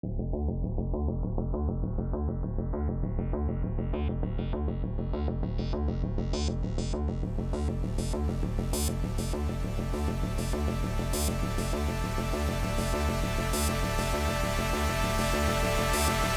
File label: Photoshoot (Instrumental)